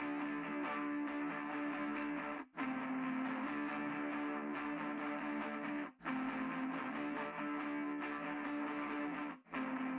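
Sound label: with drums live rec 250 ms 5 cm; SiSEC10; underdetermined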